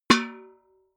metal bucket hit ring

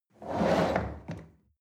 chair wheel roll on floor hard squeak

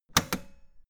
old button harsh ringing push

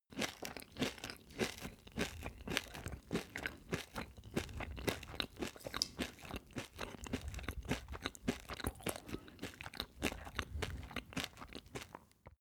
male close eat cereals

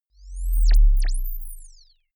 bass drop sizzle soft

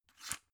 drug blister put in

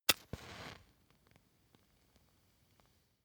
match light

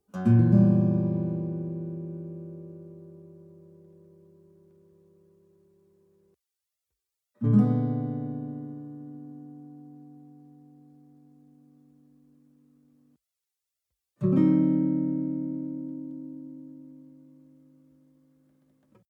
western guitar chord full diminished soft

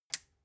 metal button push power on

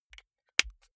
marker close lid

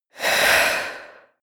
female breath medium out

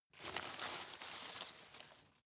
drop medium

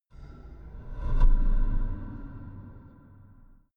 impacts

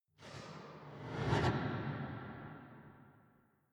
metallic impacts

